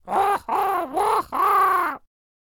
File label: Scarecrow Attack Vocals